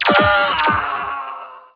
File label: lazeraah